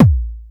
FM Kick; Drums; Ableton Foundation FreePacks

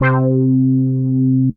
Tasty Pluck Bars, Synth Keys; Ableton; Creative Extensions; Poli

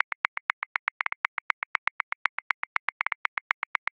Straight Reggae, Drum Booth, Grooves; Ableton

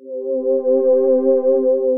Ambient Vocal, Synth Pad; Instruments; Ableton; Synth Essentials; Operator

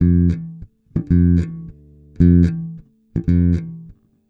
110 Pop Basic Bass F, E-Bass; Pop Rock

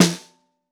Bottom, Snare; Ableton Foundation FreePacks; Snare Designer

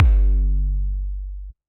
drums dirty kick; Percussive

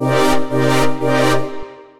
Pressure Plates, Synth Rhythmic; Ableton; Factory Packs; Synth Essentials

